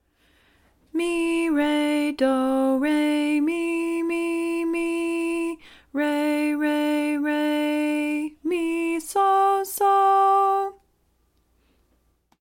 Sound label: Mary with Syllables